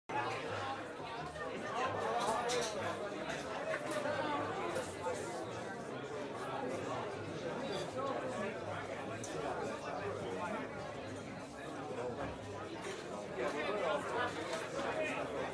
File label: Tavern Ambience